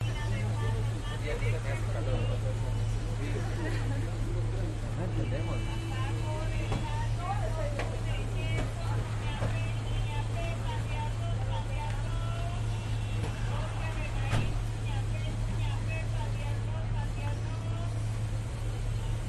street traffic cars honking woman yelling people talking walla; la paz; your free sounds